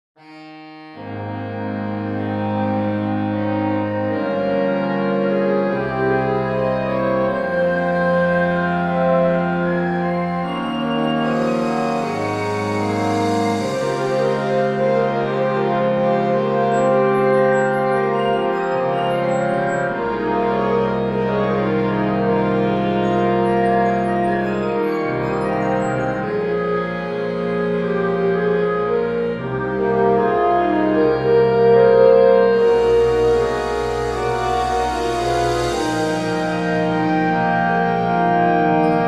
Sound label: solo